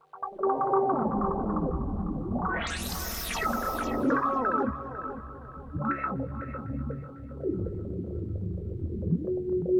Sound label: pad